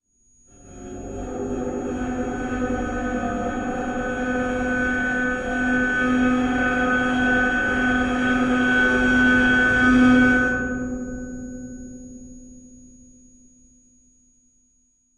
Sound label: sound sculpture hanger; sonniss gdc